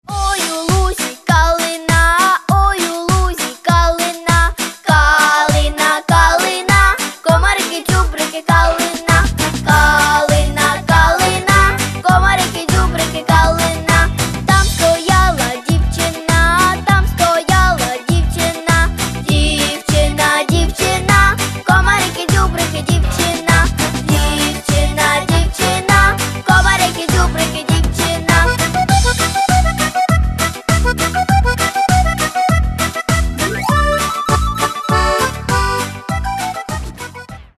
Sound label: Karaoke; ukrainian folk songs for children in modern arrangements karaoke